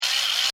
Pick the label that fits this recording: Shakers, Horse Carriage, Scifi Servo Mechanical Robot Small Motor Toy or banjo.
Scifi Servo Mechanical Robot Small Motor Toy